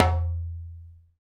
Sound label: DJMB, African Perc; Spectrasonics; Supreme Beats; African Contemporary; SB Afro DRMs